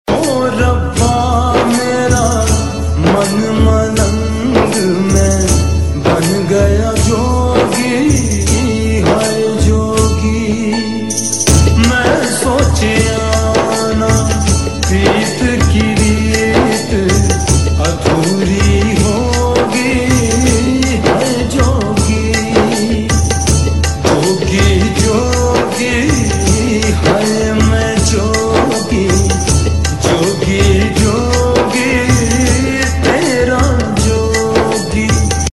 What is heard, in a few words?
Sad Tune; Veera - Ek Veer Ki Ardaas